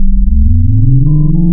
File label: reso, wavetables, Classic Synths